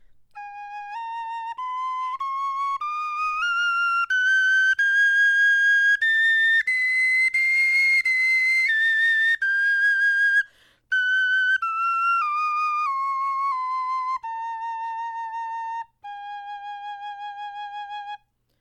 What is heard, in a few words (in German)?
tonleiter